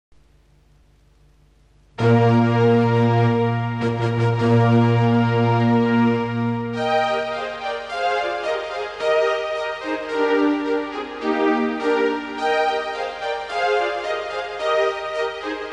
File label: Fanfare; UNICA